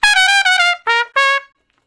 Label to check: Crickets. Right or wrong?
Wrong